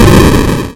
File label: explode; gemsters